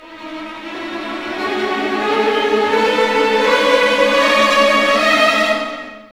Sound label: OPEN; East West; Scoring Tools; ORC; Open Theme